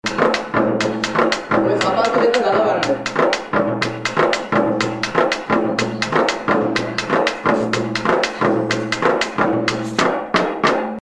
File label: sri lanka drums